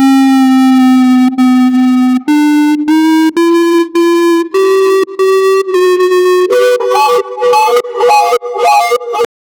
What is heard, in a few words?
baby crying, infant